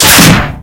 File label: tank hit small unpierce